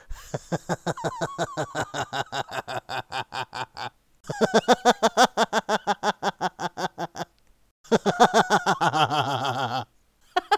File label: Maniac Kid Laugh